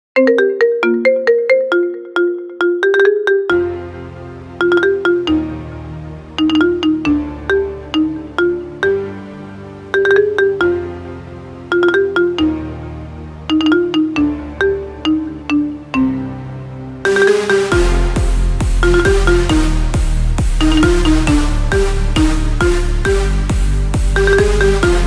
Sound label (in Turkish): taraftar zil sesi marimba remix; trabzonspor